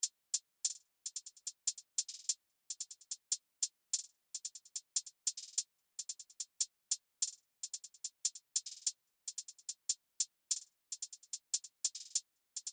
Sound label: Drill Hihat Loop 146 BPM